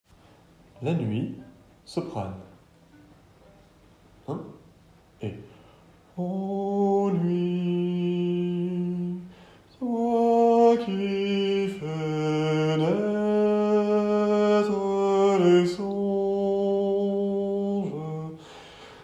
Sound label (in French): Soprane; La nuit; Rameau